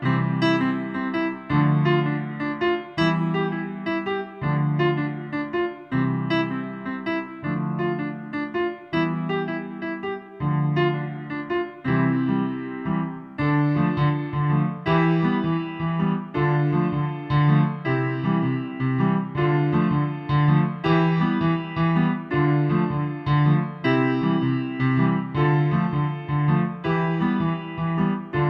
Grand Piano Theme - Loopable

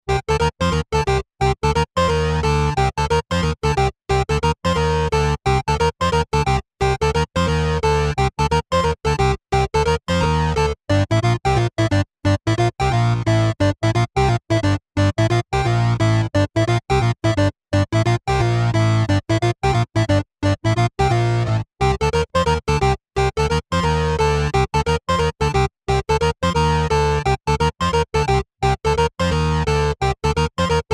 Game Faster Alternating Walking Loop, 8bit music; Gemafreie Gaming Musik

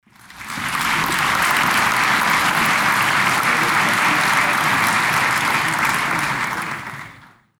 Applause

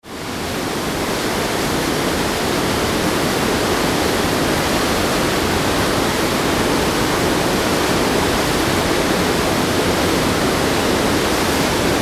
Gulfoss

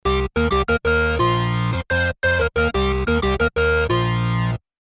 Game Faster Hippie Beatnix Theme

